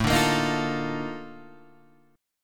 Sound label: Bb Major 11th A